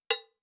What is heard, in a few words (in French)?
Cloche de bongo plat; clochebongo